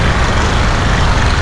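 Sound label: water pipes; ambience; XDM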